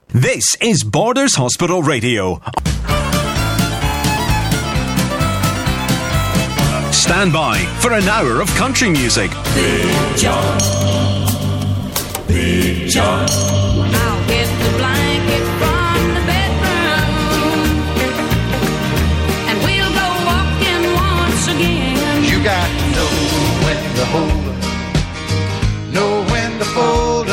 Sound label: Jingle